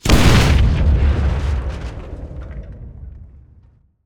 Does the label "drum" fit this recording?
No